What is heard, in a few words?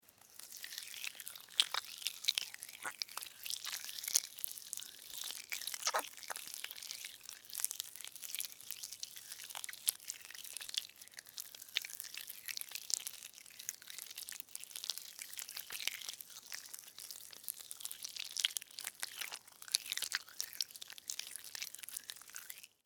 Slug Crawl Move, Slug, Crawl, Movement, Slimy, Wet, Long, Insect, Bug; Slugs; Blastwave; Animals:Insects